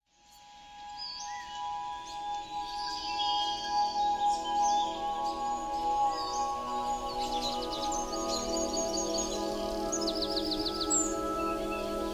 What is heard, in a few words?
Relaxing Forest Bath; Sound Baths